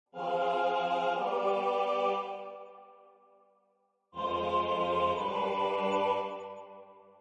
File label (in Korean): 주3화음 전체